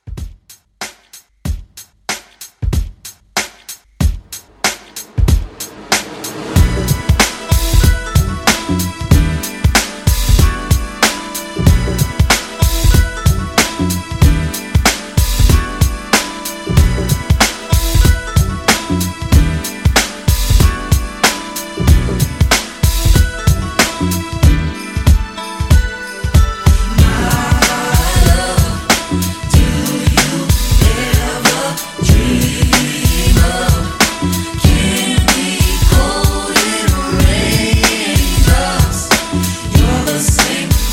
90s RB 94

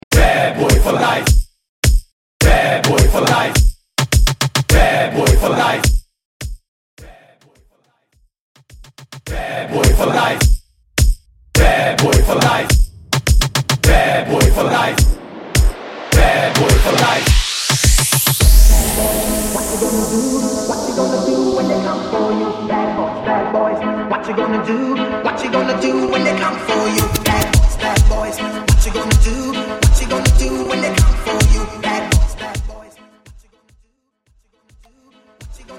90s Moombahton RMX; Mainstream VIP

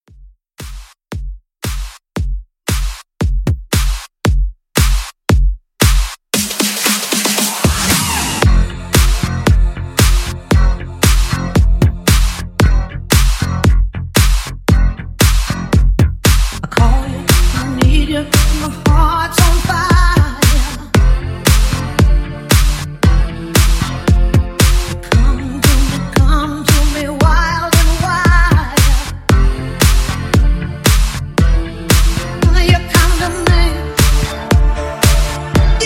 80s Pop Remake; Remixfreakz

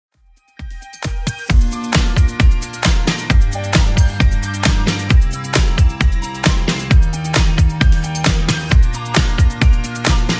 80s Club 133 bpm

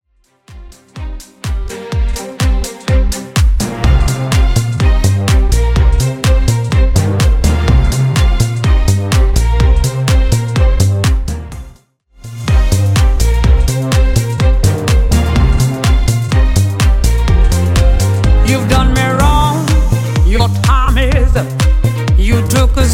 90s Re Drum 125bpm

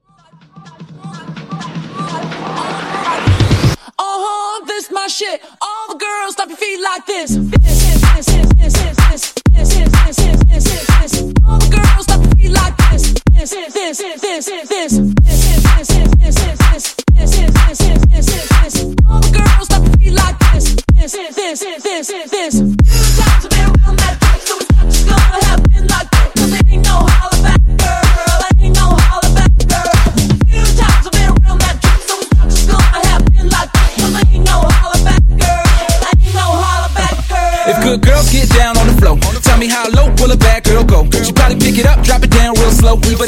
Funk 126Bpm; Hooks

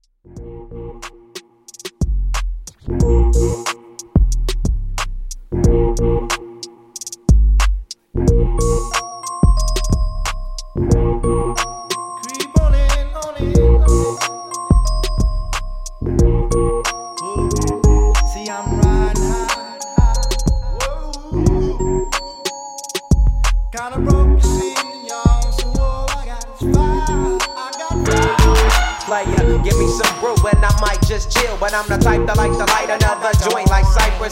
Bass Old School 91 Bpm; remixplanet

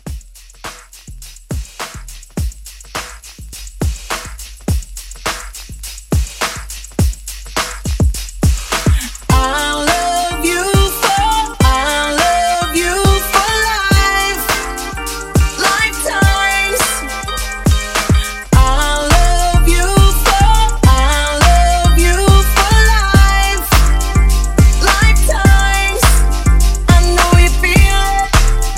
Mashup Re Drum

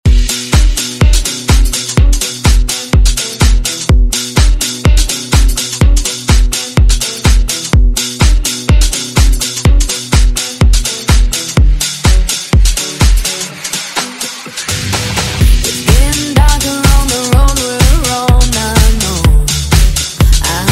Dance Redrum

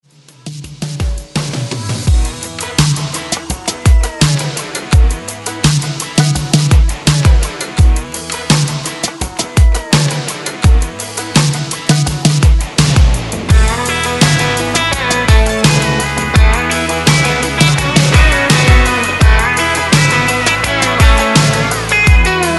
Country Redrum

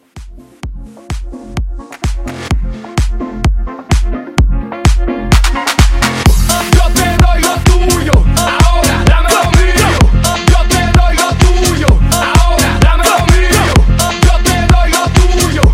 Latin Club Hype Edit 128

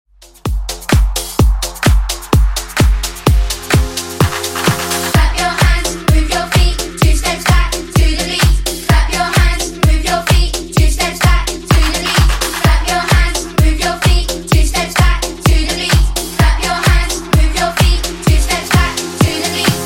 Extended 128bpm

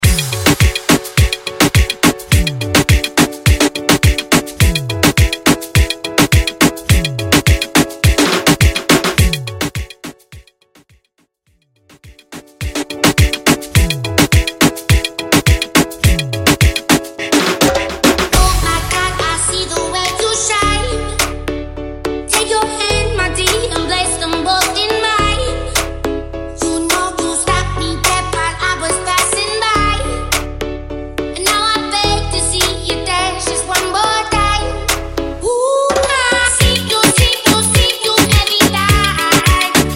Moombahton; Mainstream VIP; Vegas Edit

